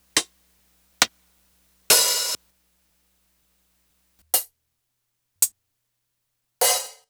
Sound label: QY70 HiHats